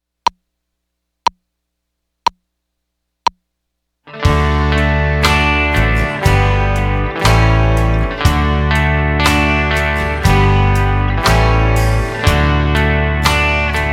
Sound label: D Melody